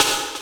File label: snare verb; DnB; Breaks N Beats Sound Kit; DnB Kit 2